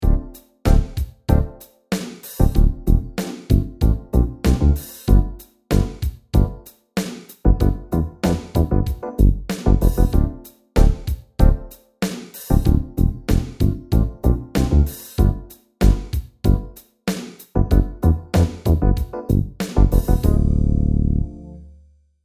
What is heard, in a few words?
Disco Sub, Funk Disco Basses; UA Theme Packs